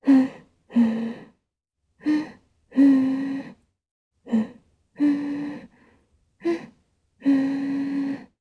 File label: Hum; heroes; Tanya; kingsraid